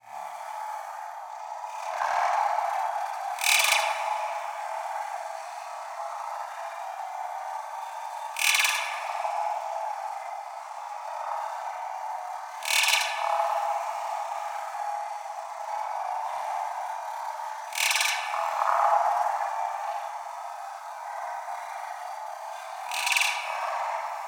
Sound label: Texture Alien Bugs, sci-fi electric; Soundopolis